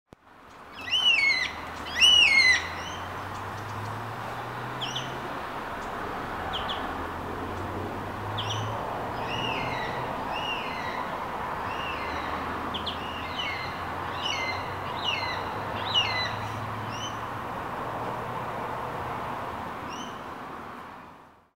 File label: flat window plus birds